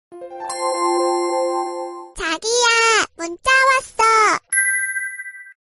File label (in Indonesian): Nada Dering Whatsapp Lucu Drakor Lucu Ringtone